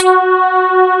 wet bass; Wizoo - Powered DX

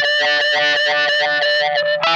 OVER WAH, Funk Guitar; 90 s Sample CDs; Zero G

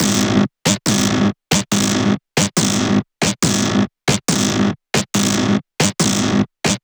140BPM G#; Vengeance - Essential Clubsounds Vol.4

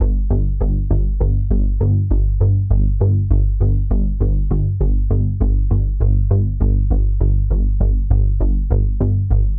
G Bass Calm Suspense, 100; Zero-G; Future Wave

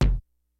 KICKS; Kid Nepro; Elite; KICKS SNARES

